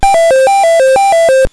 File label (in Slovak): hraci automat; technika